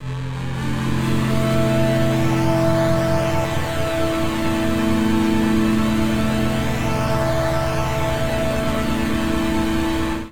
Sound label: Nostalgia Strings A Minor 93 bpm; Accompaniment; Tonal; MIDI Clips; Drive and Glow; Ableton; Factory Packs